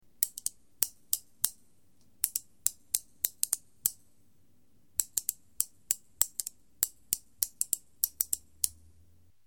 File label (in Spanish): toca tabolis; reciclar tocar